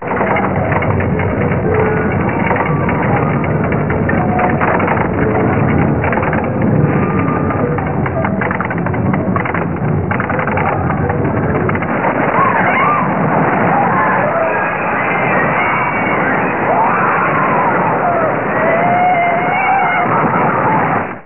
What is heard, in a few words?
coaster clicks